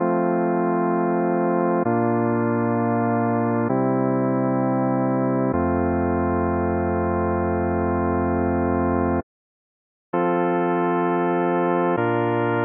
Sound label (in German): vollkadenz gleichstufig; Psychoakustische Grundlagen der Musik